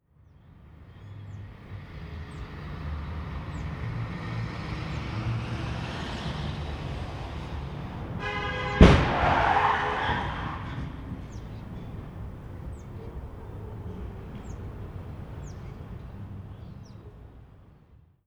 crash

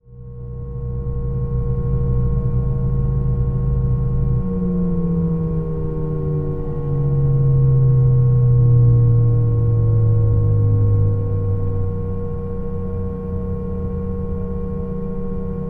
Jet Stop; From Suitcase

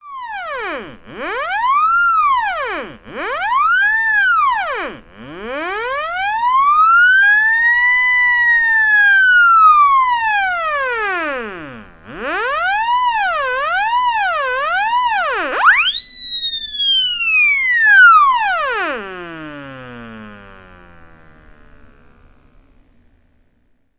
Blown Speakers

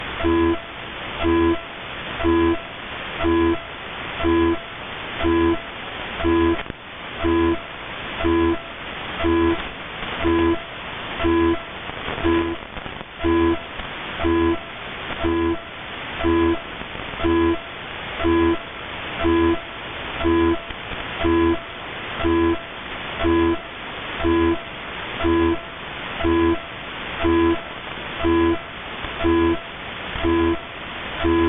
honk time

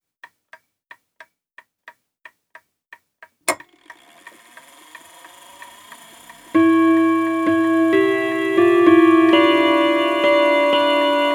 vox clock chimes what

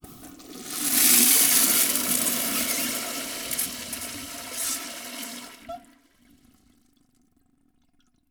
New Toilet; Temp House

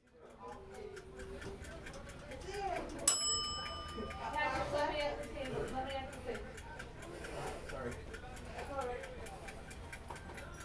Alarm

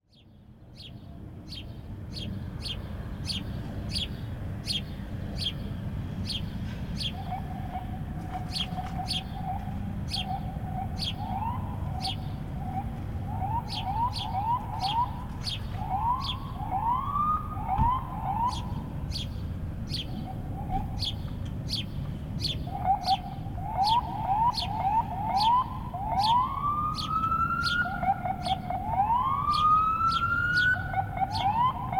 Siren Vs Bird

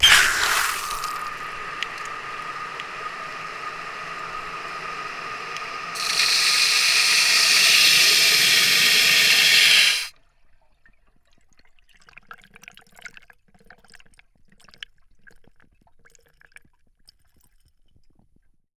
PZM flushometer

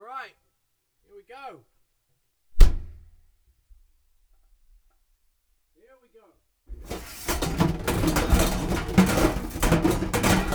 dryer

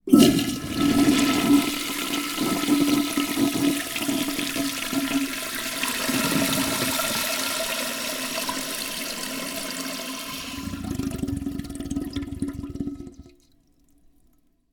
Temp House Toilet

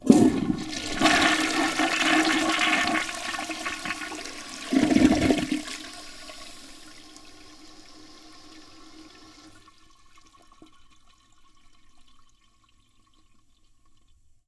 Upstairs Toilet With Rode NT4

